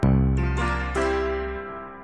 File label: Strum; UkeFest 2021